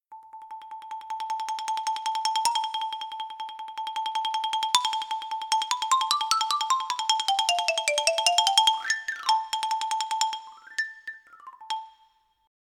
Sonido de xilofono; Instrumentos de percusion; Percusion determinada; laminas

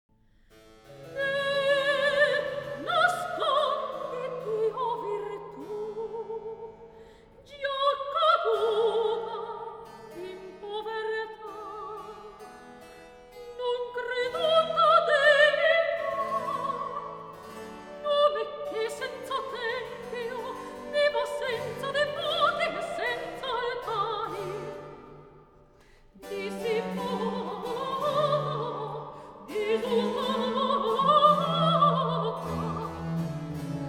Breve recitativo y aria; del Prologo de La coronacion de Poppea; Barroco

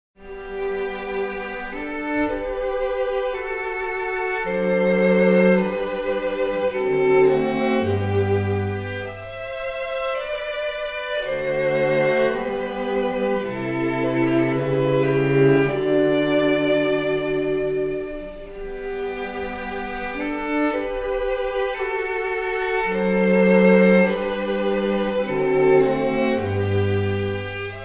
Cuarteto de cuerda; Agrupaciones de camara

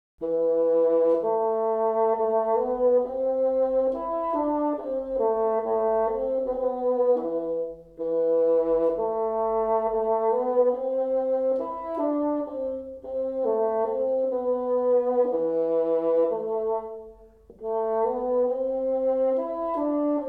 Sonido de fagot; Instrumentos de viento; Viento-madera; fagotes

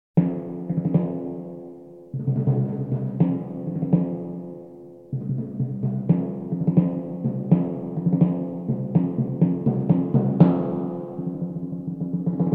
Sonido de timbales; Instrumentos de percusion; Percusion determinada; membranas

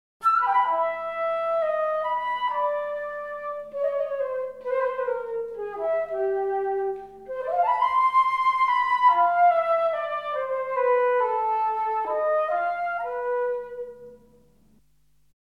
Sonido de flauta travesera; Instrumentos de viento; Viento-madera; flautas

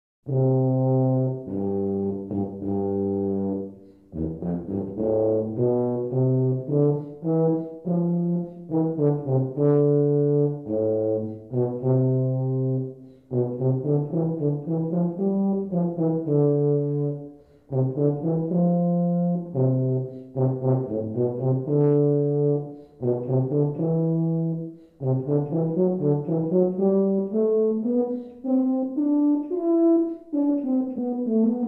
Sonido de tuba; Instrumentos de viento; Viento-metal

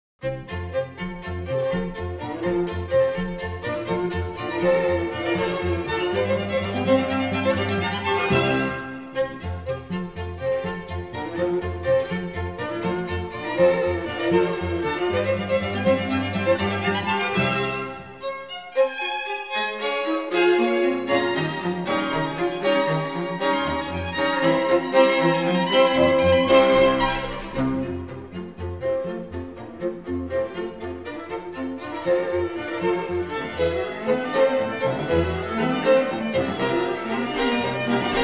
Sexteto de cuerda; Agrupaciones de camara

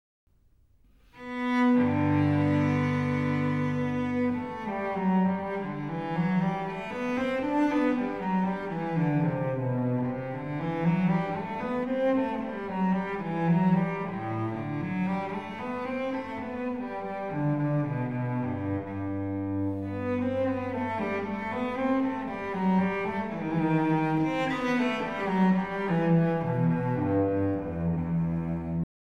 Sonido de violonchelo; Instrumentos de cuerda; Cuerda frotada

